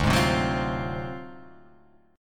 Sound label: D 7th Sharp 9th Flat 5th, Guitar Open F; 2,3,0,1,3,0; strummed; JGuitar